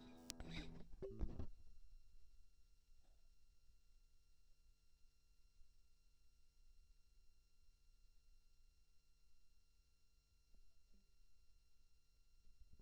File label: New Wave Chorus